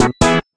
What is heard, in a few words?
Box Common